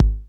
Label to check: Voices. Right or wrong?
Wrong